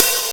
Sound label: good open hat one shot d sharp key